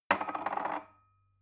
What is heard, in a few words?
glass shelve wobble